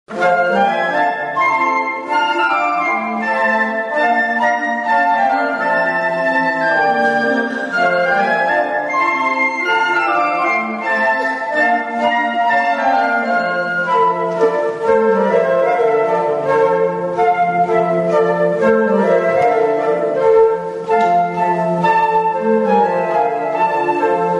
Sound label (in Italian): per 5 flauti; Jingle bells